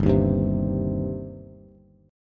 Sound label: G Suspended 4th Sharp 5th G, down, Bass; Gsus4#5 strummed; JGuitar